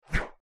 Quick Single Rope Swi, swish, rope; cable

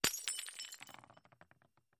Glass Breaks Distant; windows & mirrors

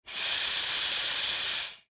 Steam, releases

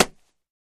Boxing Glove Hit Slap

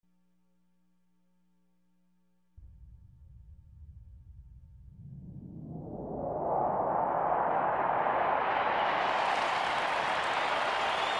Arena Crowd Cheer Up; sports arena

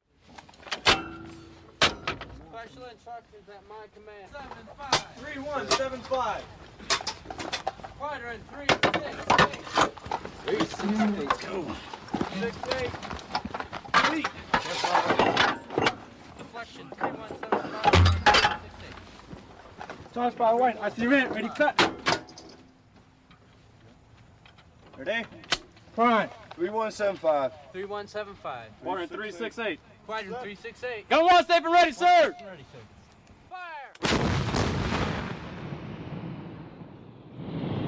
Cannon 120 Mm Mortar, 120 mm mortar exterior

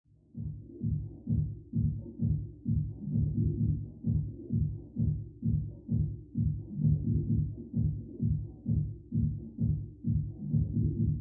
Mx Electronic Dance

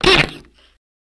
Nail Being Pulled Ou, hammers

